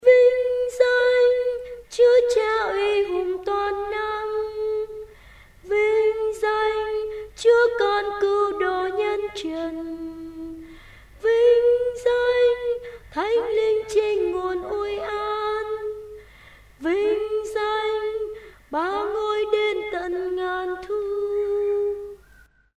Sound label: tenor